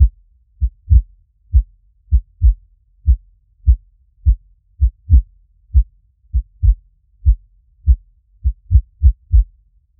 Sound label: low health heart beat